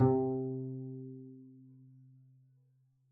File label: pizz c3, double bass; dsmolken